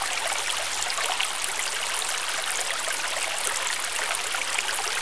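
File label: fountain small; Star Wars Knights Of The Old Republic I; XBOX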